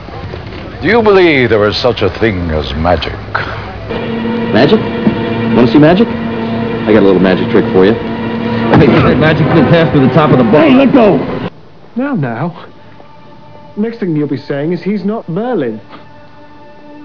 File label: magic mix